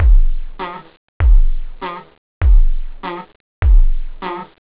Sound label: katang, instruments wave loop house